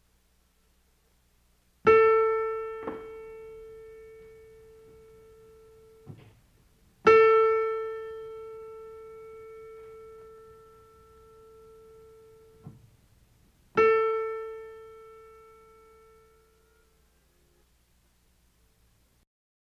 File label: horns guitar tuning note; Jazz Studies Auditions